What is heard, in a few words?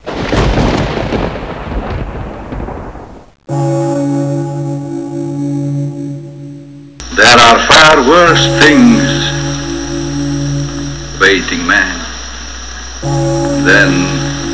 bells